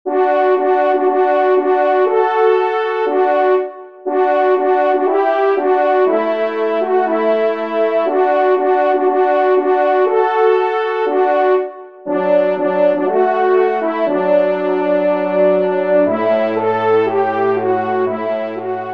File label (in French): Trompe DUO; Benedictus; messe d'estival